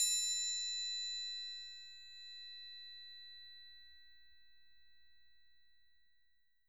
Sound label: Tri op, percussion